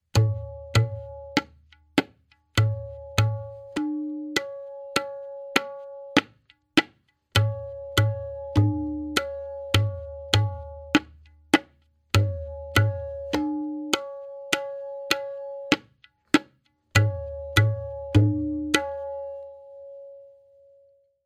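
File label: Main Bol 1x-Played, Benares Kaida 1, Tintal; Benares Kayda 1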